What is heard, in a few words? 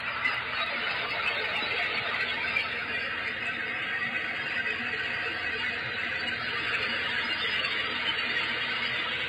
weird microphone noises; aqara g100